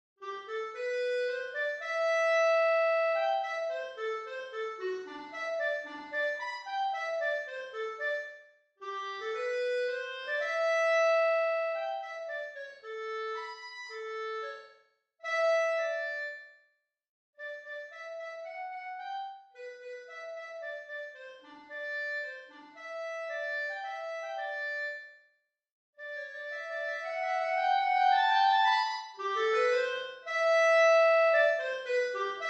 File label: Clarinet transposed; Band; UVM